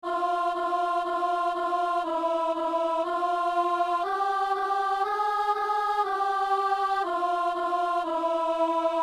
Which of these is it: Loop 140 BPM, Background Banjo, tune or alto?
alto